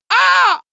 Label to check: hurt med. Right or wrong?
Right